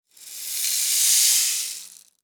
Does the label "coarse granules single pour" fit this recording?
Yes